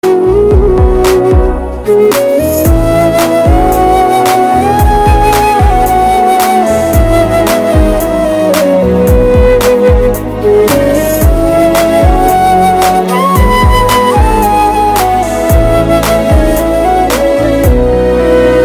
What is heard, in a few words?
instrumental ringtone